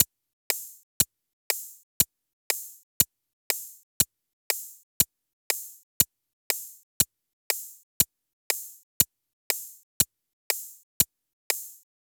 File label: Clock